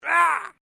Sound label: MALE OUCH